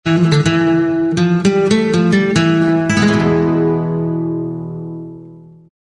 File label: spanish guitar melancholy accent